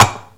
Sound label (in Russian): steklyannuyu posudu postavili na stol